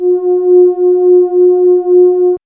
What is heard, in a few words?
MOOG; AMG - Vince Clarke Lucky Bastard